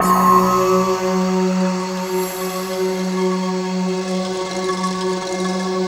F#3, bamboo

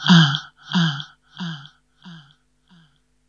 VOX, Hip Hop R n B; USB Soundscan vol.19; AKAI; HHFX 89

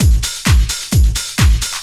drum 130 trance

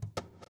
CAJON; Univers Sons - Raricussions

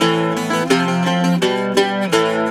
Celtic Flavours; USB Soundscan vol.42; AKAI

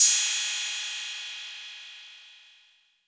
Roland 808 CY

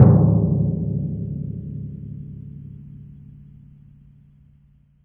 TMP, Synclavier Percussion; ILIO; Synclavier Percussion 2; World & Orchestral

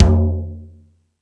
Rap Session, E-MU Pro-Cussion

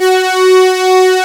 OB STRINGS; E-MU Formula 4000 Series Vol. 3; Analog Odyssey

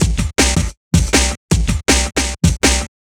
Beat, Drum N Bass

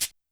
Cabasa; YAMAHA RM1X

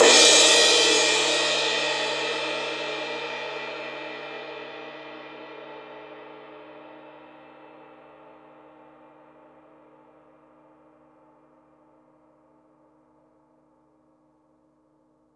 crash cym; Drums Percussion; Drum Bass; Zero-G; Total Drum Bass

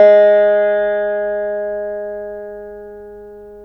VEENA G#4; Spectrasonics; Heart of Asia

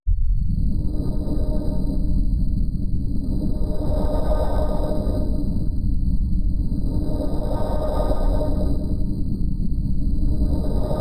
Low Bit Wind; Drum & Bass; FX; AMG - Prototype Drum & Bass

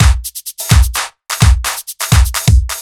Kit Heavy Swing, 85bpm; off the grid

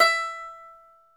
banjo E4; InVision Interactive; Lightware VOL-1; Instruments & Percussions; BANJO ONE

